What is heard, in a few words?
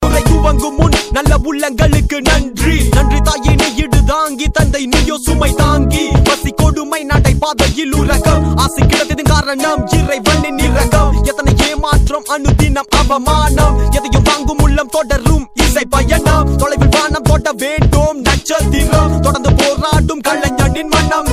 Tamil Rap